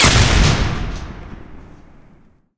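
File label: explosion generic medium; Source Engine; Portal 2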